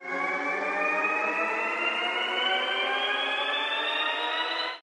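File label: respin sound; longpao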